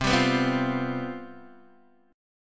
C Major 7th Suspended 4th, C, down, Guitar, DADGAD